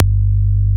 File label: Moog G1, analog bass; Music Store - Claudius Diemeter Schwerlos